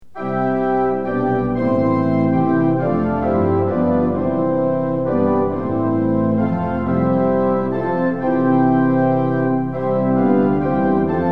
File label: tune; hymns; lillenas